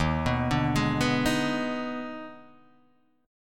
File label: Eb Major 7th Suspended 4th Sharp 5th Eb, Guitar, Half Step Down